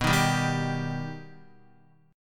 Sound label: B Augmented B down Guitar; B+ strummed